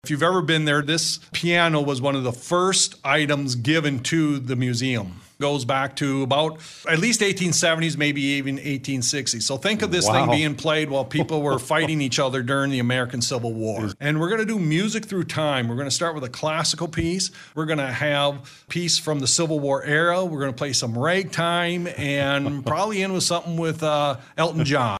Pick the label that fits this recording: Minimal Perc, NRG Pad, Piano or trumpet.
Piano